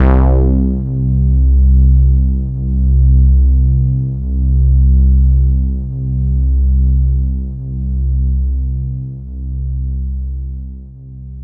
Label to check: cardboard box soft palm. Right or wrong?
Wrong